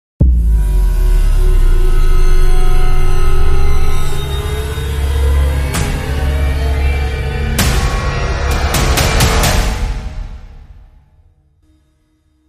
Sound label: Crime Sting